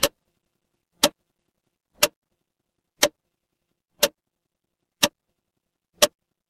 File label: mechanical clock tick